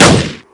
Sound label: mk18 shoot; tf2classic